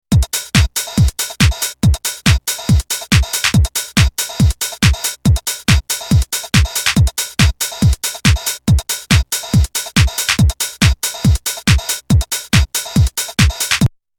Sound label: Drumloop